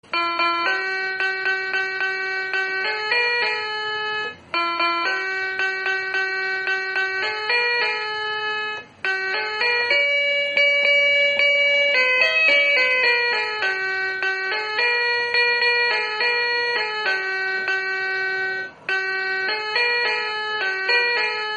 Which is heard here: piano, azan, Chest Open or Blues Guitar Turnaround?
piano